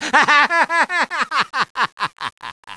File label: laugh